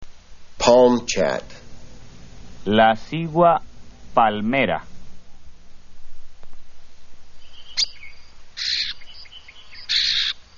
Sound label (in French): chant